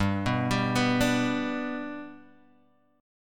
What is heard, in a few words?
G Major, Guitar, Standard; G arpeggiated; JGuitar